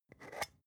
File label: spice jar scratch tonal open slow; industrie wirtschaft; gastronomie